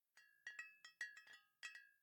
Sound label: Bottle Shake; The Town